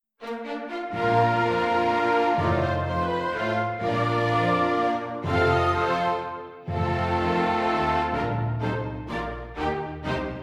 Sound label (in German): Menuette fur Orchester, Es Dur; Sechs Menuette